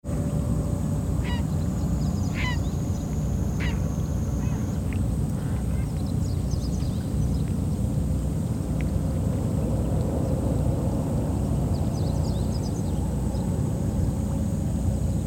muddy flat

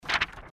paper

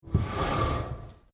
school classroom desk

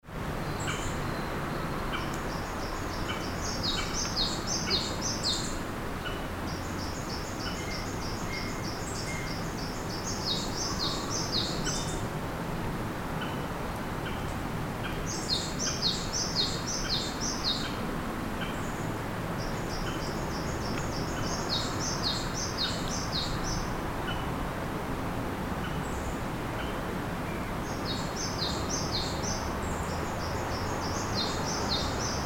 harbor

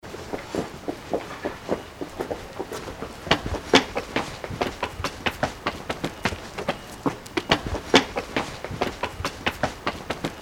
footsteps

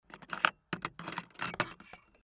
tool box

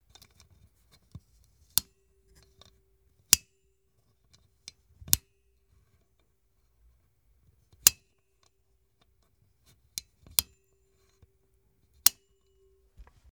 switch

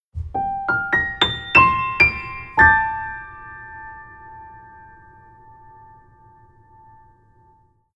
piano flowing

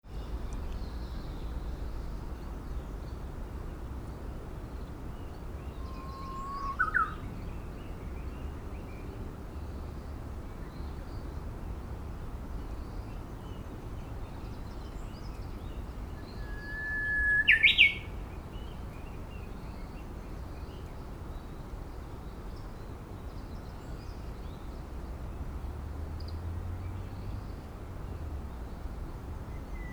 waterfall

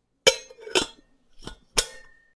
kettle

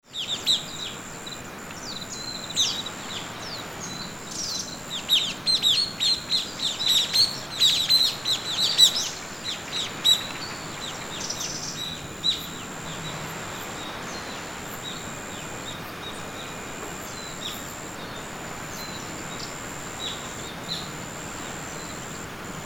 wave

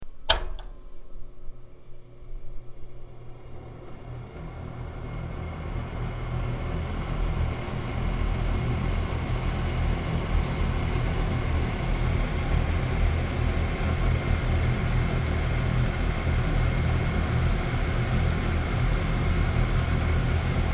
kitchen fan

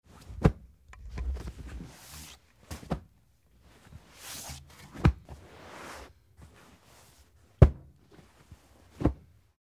zabuton

